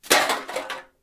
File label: throw away can